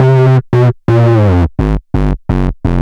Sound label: Bass B dist 170 C, drum n bass 170bpm; musicradar; Basslines B